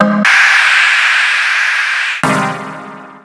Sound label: Korg Volca Sample, Samples Sliced; m8